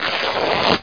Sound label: cut